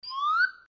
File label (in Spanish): flauta